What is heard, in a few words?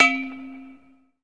Baseball Hit Metal Pole